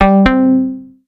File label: Simple Approval; Timecrest Music